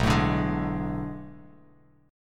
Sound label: B Minor 13th B down Bass Standard; Bm13 strummed; JGuitar